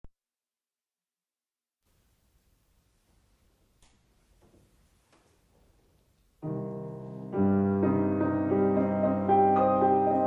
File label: Chopin Etud C Minor Ocean Wave